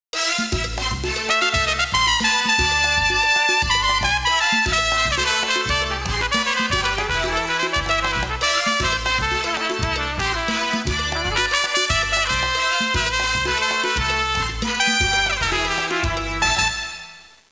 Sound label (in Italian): musica latina